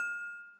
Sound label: bell